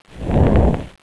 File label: Flyby; HAVOC; RB Sounds